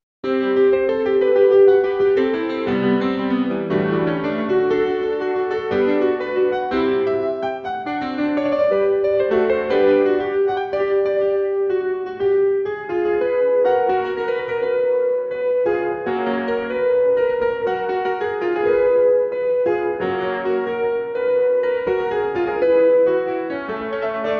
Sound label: classic music